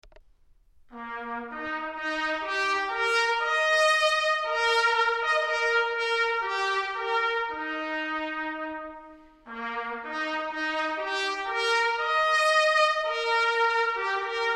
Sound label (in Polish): Hejnał; Hejnał Sołectwa Tyniec Mały